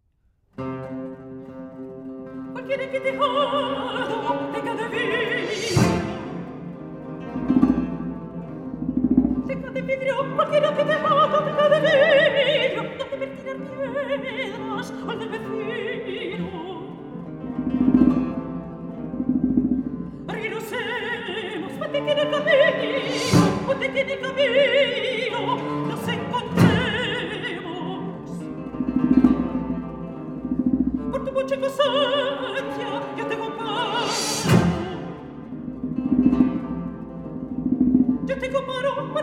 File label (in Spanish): seguidilla murciana; falla